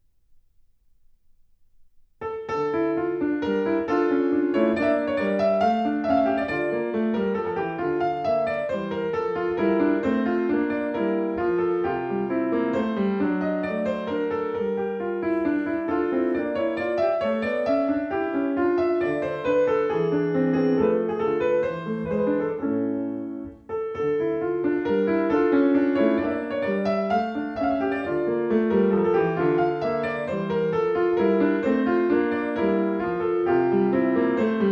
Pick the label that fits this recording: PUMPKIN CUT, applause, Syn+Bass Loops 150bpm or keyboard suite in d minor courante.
keyboard suite in d minor courante